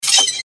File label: metallic squeak